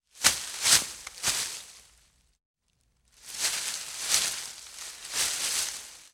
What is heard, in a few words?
Bush Whacks Shoves Movement; Fairlight Sound Lib